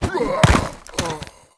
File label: death; quake2; railz; quakeguy